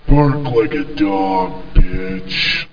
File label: BARK; Duke Screw'em 38D